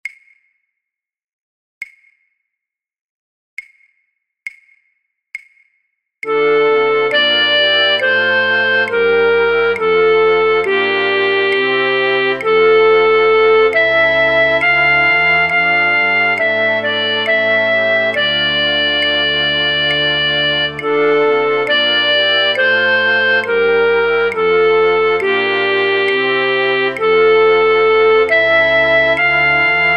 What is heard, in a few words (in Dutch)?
fluitorkest Fluit; voor oefentracks; Matthaus Passion